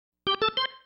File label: Single Riff, Organ; Sonic Foundry (Sony Creative Software); Joe Vitale Organ Donor